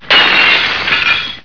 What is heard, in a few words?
glass shatter